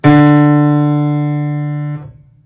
key, pianosaurus

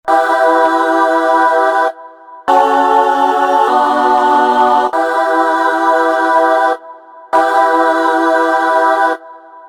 panic; Garry's Mod; sprunki pink

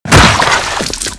poison spit; worm pack

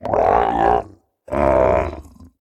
pain, procoptodon; zoochosis pack

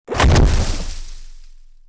step; saxosaurus; pianosaur curse

